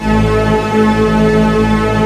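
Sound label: D3, full orchestra; East West; Global Sounds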